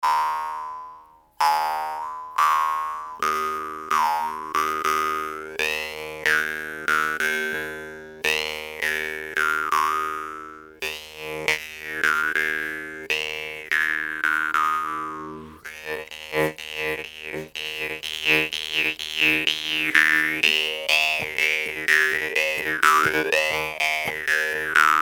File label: LYRE